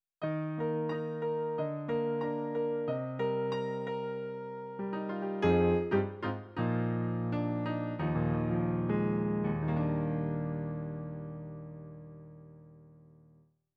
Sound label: Grand Piano